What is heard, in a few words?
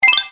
Points Get; Mr. Bean - Bean Skidding